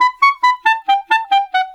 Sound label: Clarinet; Sonic Foundry (Sony Creative Software); Jazz Solos and Sections